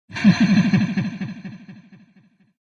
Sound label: robo laugh